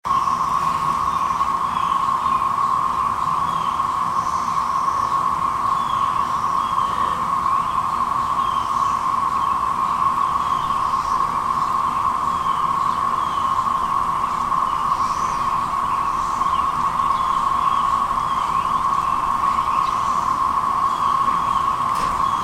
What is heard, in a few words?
Locusts